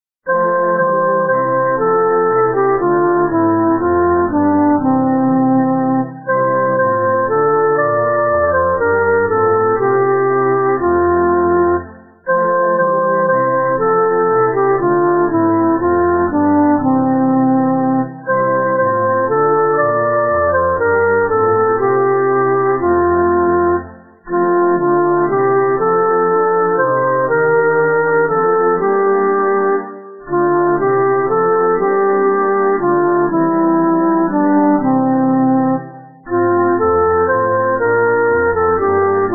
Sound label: soprano